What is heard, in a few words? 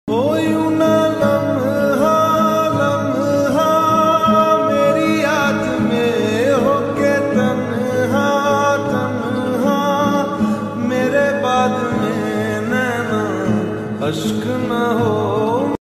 lofi slowed reverb